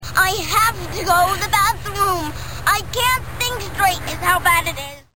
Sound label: bathroom